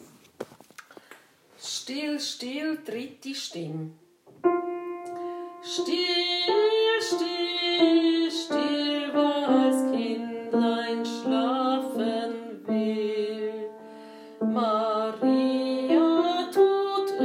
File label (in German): Still still 3. Stimme Alt